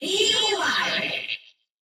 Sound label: Scream Dry; Bosch